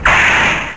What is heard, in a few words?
bash it